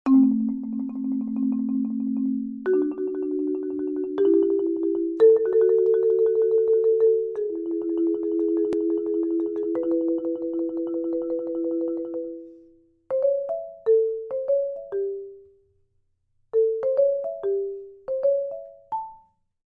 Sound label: Marimba